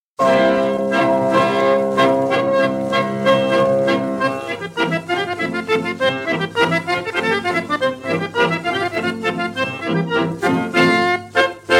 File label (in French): Oberek, Pologne